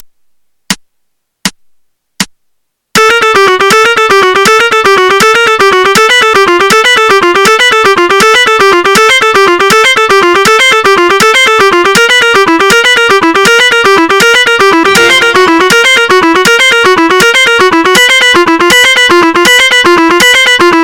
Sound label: legato exercise, guitar